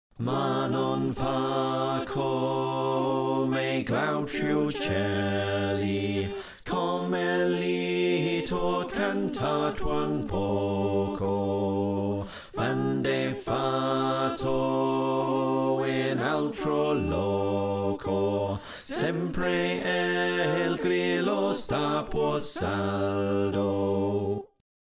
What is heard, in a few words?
Slow Bass